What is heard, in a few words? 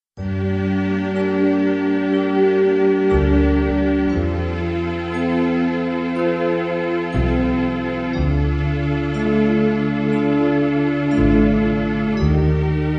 spa music 432 Hz; wiatr wspomnien